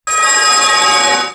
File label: Alarm Clock